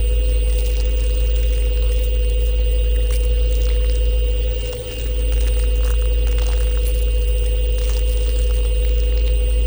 ship harbinger idle; ships; biogenesis

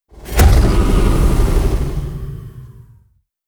neutron fire layer; apocalypse

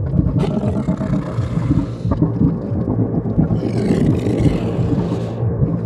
swarm small station idle; Stellaris; other entities

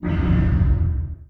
distance laser fire, weapons; Stellaris

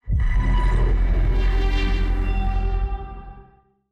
event psionic; Stellaris; utopia

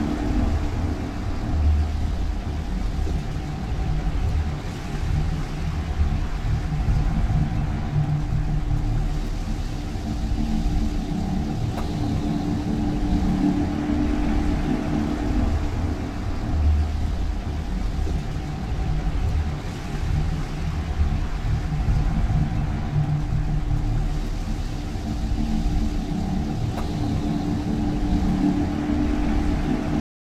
ship juggernaut idle; Stellaris; machineage

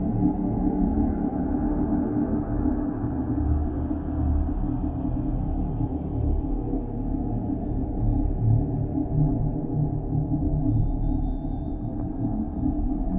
ship cruiser idle; Stellaris; machineage

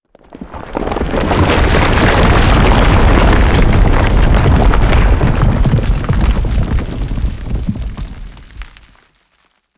collapsing ruins; ancient relics

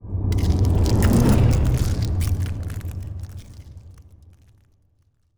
pro ship colossus move; biogenesis

